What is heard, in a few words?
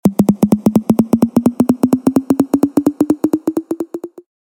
Notification